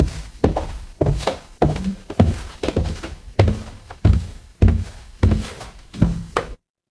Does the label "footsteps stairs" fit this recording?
Yes